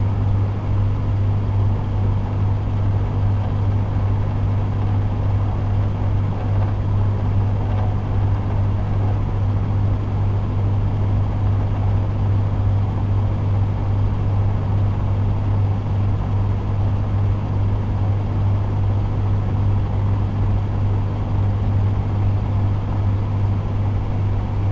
fan electronic computer low rumble fast tumbling loop; sonniss gdc